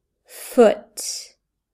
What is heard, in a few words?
foot